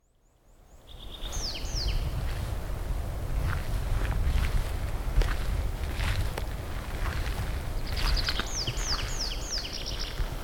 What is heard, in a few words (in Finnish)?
metsäkirvinen; linnut